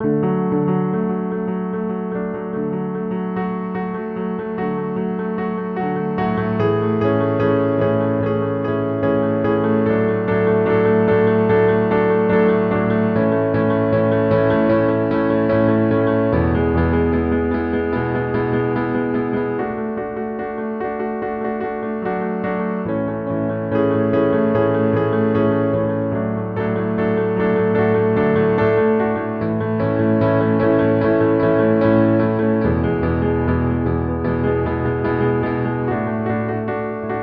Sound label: piano